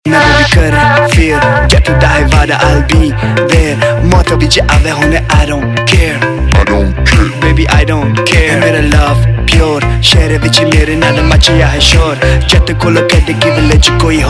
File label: Ringtone, Punjabi Pop